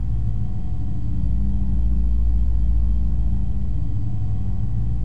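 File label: elevator hall ambience; Star Wars Obi Wan; XBOX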